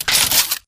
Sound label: Sentry Turret Rack Slide; Boost Card; Titanfall 2